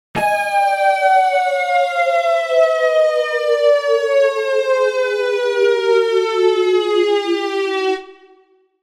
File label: vital presets; chiqui audio; vital fx presets